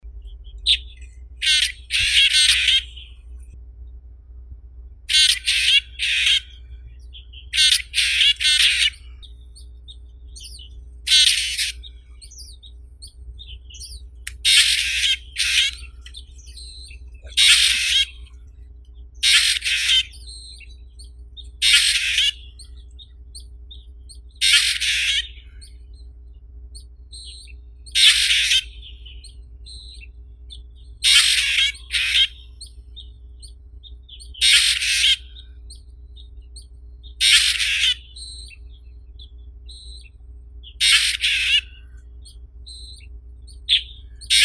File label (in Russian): drongo chernii dicrurus macrocercus